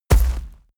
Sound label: hellhound step; schritte